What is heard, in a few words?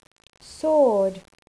sword